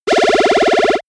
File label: laser pistol; krieg-waffen; soundpool